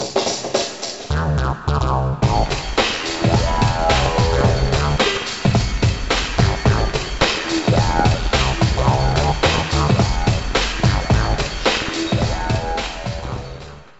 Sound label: Ditty; NBA ShootOut 2001